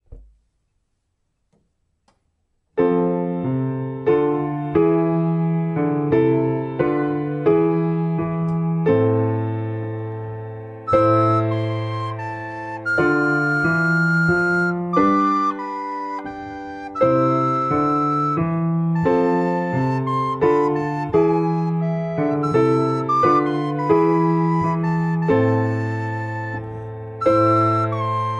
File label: menuet